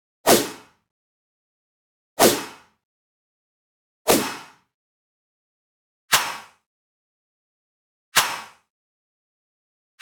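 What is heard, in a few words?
slideshow subtitle switch effect at conference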